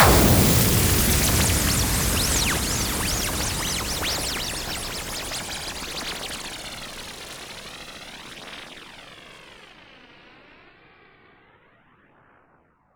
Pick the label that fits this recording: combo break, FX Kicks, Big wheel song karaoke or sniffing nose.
FX Kicks